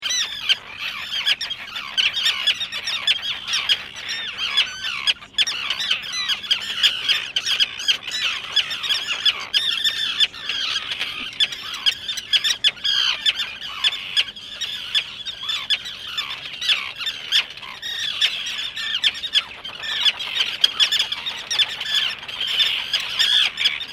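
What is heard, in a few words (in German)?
Flussseeschwalben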